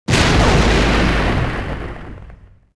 damaged; atst

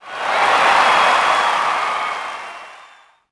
Cheer, Crowd, reactions

